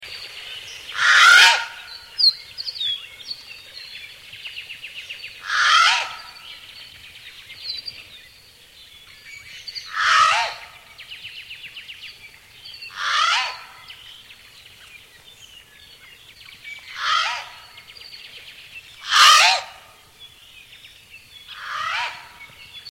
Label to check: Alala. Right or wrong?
Right